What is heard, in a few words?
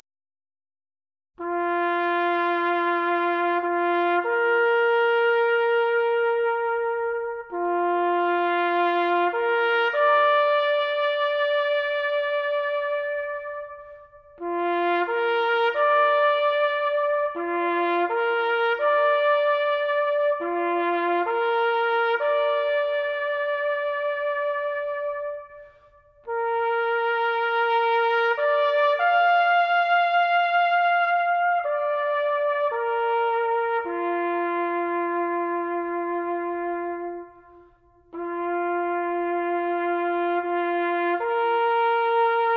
US Army Taps